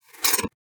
flutter short, Mograph Mini Transitions; CinemaSpice

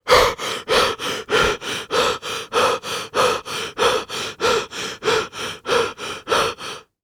Horror Scary Human Male Panting Heavy

